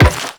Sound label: Run Metal, Footsteps; Warframe; The New War; Characters; Kahl